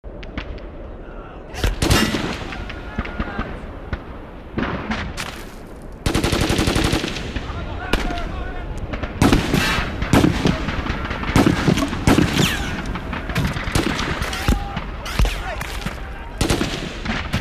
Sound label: Amb Battle Ext